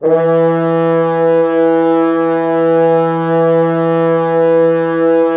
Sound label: Orchestral Brass